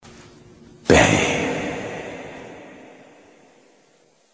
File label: Bang; Cowboy Bebop